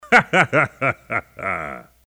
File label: Laugh